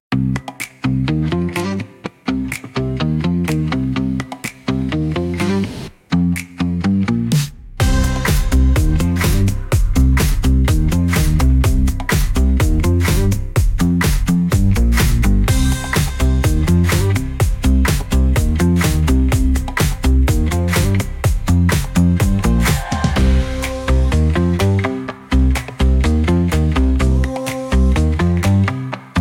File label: Pop